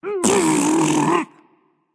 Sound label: tongue, voicepack; smite; jorm